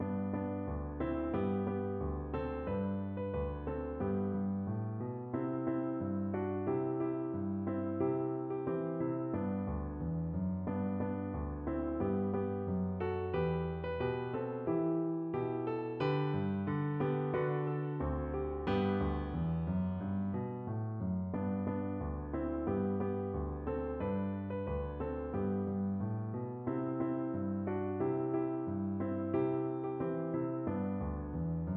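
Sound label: violin, piano; do lord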